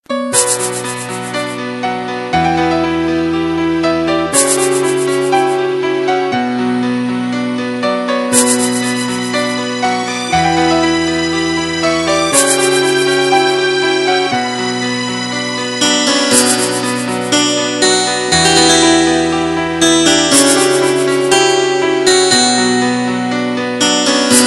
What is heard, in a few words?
Arabic Music